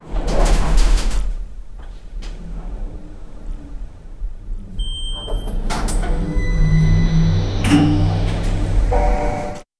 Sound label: the sound of an elevator arriving at a floor with a beep; arrival